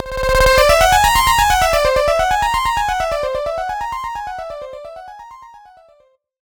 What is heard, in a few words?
ringtone; Google; Android Open Source Project